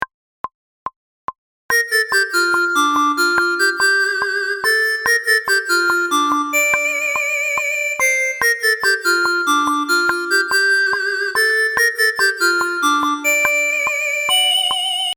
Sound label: Lead